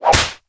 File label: Punch Face Whip; Blastwave FX